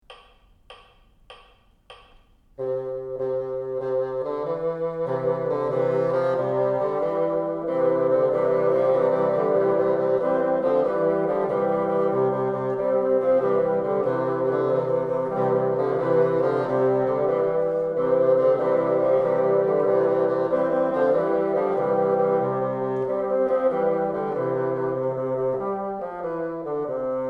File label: together, bassoon